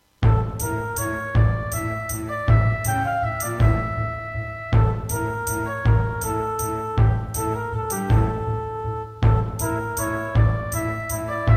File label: Roland SC-8850